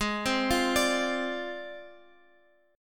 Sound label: G sharp 6th Flat 5th G sharp Guitar Open F; arpeggiated; x,x,8,7,8,9; JGuitar